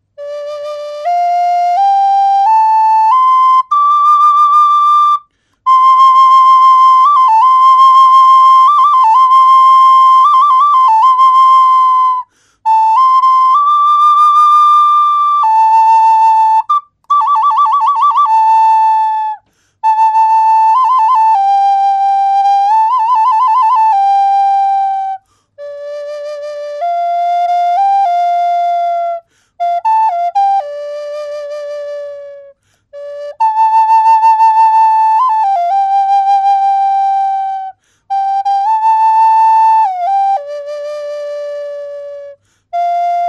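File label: Cedar Classic, flute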